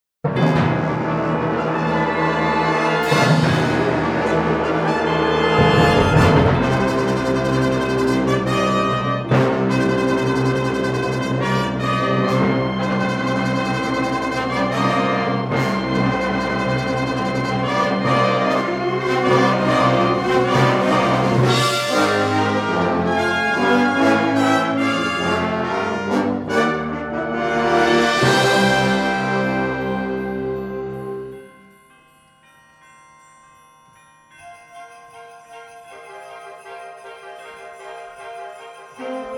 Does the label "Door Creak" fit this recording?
No